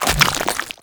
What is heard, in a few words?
Step Sludge, Footsteps, Walk; Warframe; Characters; Tenno